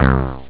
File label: Bass; SynthSamples2